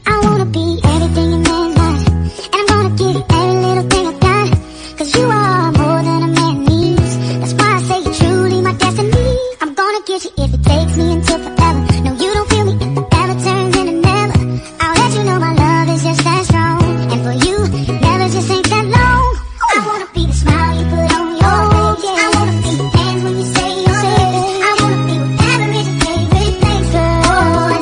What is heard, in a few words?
Cute Love English Song Ringtone